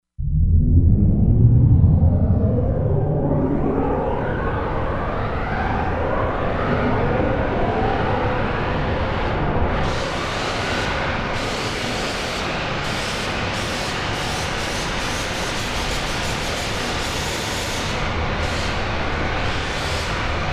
SFX, Korg MSC-16S; Environment

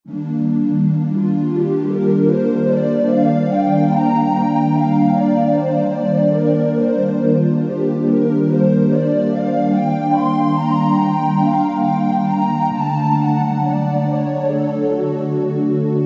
JP-8 Hollow; Roland JV-2080

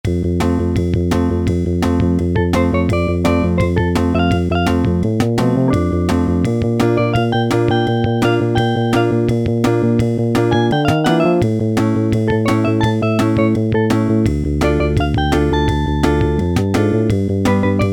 HARD ROCK, Yamaha SHS-10 Factory style demos

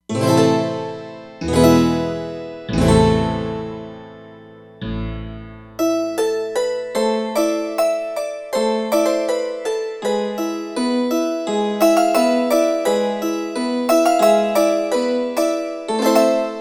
Harp Tones, E-mu Proteus-3; Factory patches

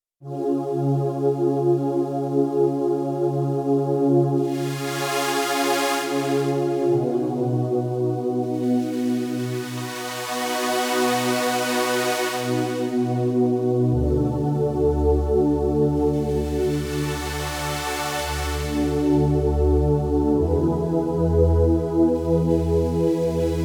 Audio Mod Pad, Korg Radias-R

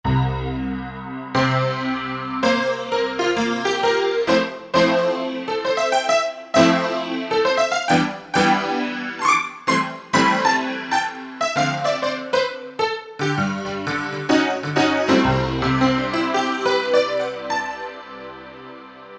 Chorus Piano, Roland U-220; Factory Patches

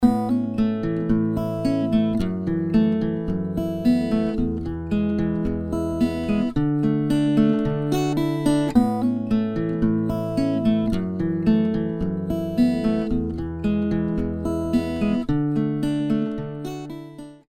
Acoustic Picking; Apple GarageBand 2; Apple Loops

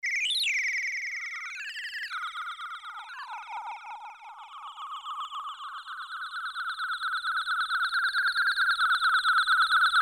Rotor 3, Roland U-220; Factory Timbres

